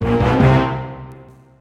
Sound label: game start